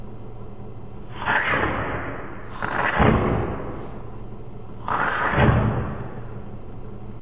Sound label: rockets; smiertspionem